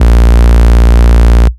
Root A#, Ultimate Bass; Vengeance Samples

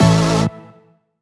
Synth Shot; Vengeance Samples

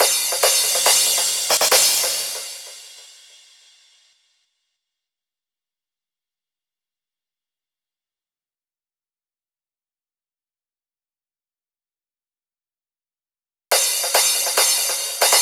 Crash Mix, 140 BPM; Pitched; Vengeance Samples; Vocal-Hook Kits; Song Kit; Break The Walls

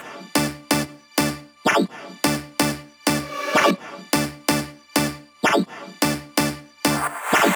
127BPM Melody; Vengeance Samples; The Mission Kit

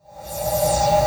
Sweeps Short

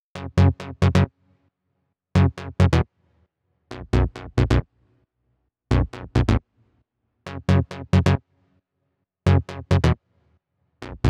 135BPM Bassline, F# minor

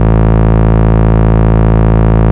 Bass Root A

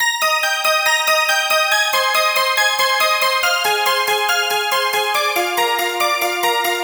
Bells, 140 BPM; Vengeance Samples; Vocal-Hook Kits; Song Kit; Play Girl

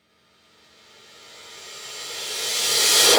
Cymbals Reverse; vengeance essential club sounds-3

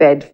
Vocal Oneshots; Vengeance Samples; Vocals

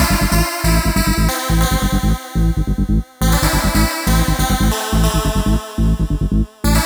Special Sounds Root A 140 BPM; vengeance essential club sounds-3

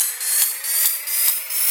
Fill 140 BPM

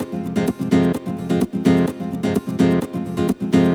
Nylon Guitar F min, 128BPM; Vengeance Samples; VEH3 Nylon Guitar Kit 2

